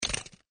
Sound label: trash